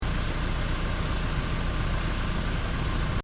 Car: Engine Ticking Over, Exterior; Car tickover; Cars; Vehicles; Stuart Duffield

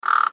Bird Raven Throat, Bird Raven Throat Squawk; Animal; Birds; Blastwave

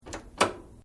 Switch Washing Machine Press Release; Various switches; Appliance Sound Effects; Appliances (Sound Effects:Household)

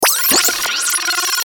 Stinger Whir Beeps; Production Element Imaging Element Accent Transition

